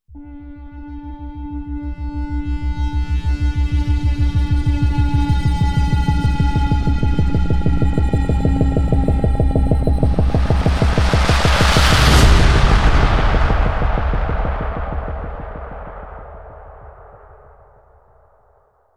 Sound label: Hit; CSI Miami